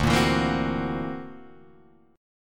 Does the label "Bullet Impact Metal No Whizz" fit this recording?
No